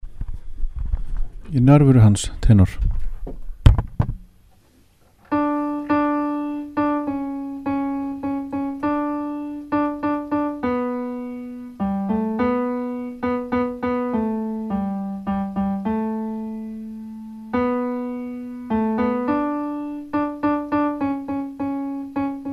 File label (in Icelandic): tenór